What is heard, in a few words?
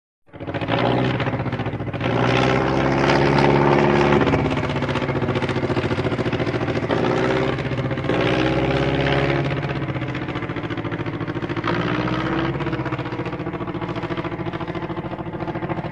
Taylorcraft: Circles: High; Clattering Engine Idles With Intermittent Bursts Of Speed. Audible Hissing Of Vents At Close Range. Medium Perspective. Prop Plane.